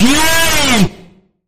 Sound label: Sharp Bend Ahead, E